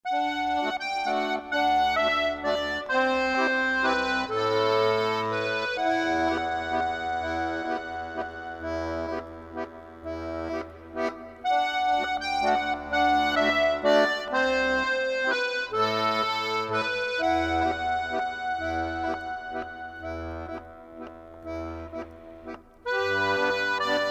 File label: Accordion, Quirky Music